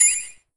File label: combo Sound; Friday Night Funkin